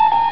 warning; pacsim

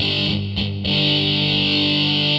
GUITAR, ROCK POP; MAGIX

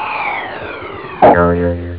arrow, effects

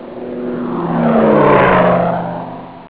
PLANE PAS; SkirmishPAL

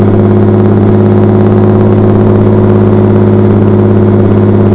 ENG

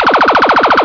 LASER GUN

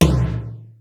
POP TOM; BEAT2000

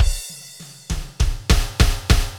FILLS, ROCK POP DRUM; MAGIX

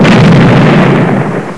EXPLO; MEGARACE

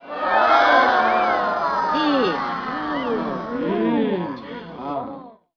HURRRA

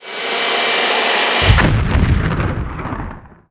vacuum

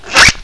scratchy

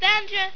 KIDS; KRONDOR

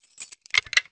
KEY DESK

